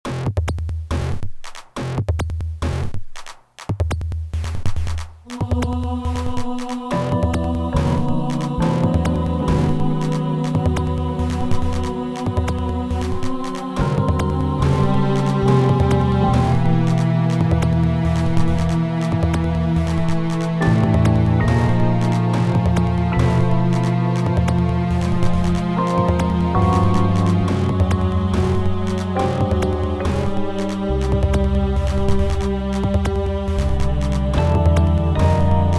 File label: Sad Song